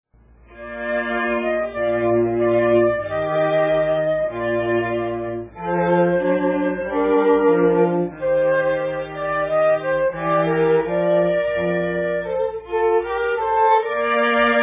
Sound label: chorale